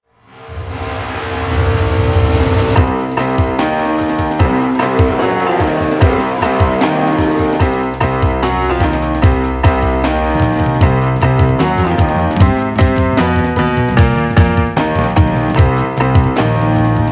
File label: chortle